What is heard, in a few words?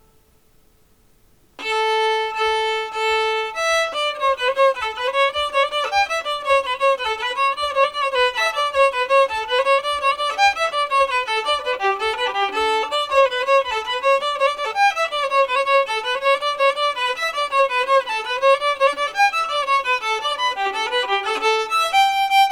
Rest Hour Jig